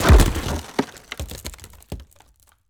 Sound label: creature wood texture crack; Bluezone Corporation